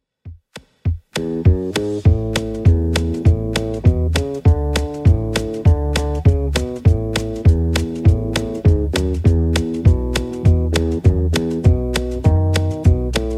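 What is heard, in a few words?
60s Redrum 100bpm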